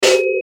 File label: Hit Tin Distortion Glow, Hit Bass Phaser; Blastwave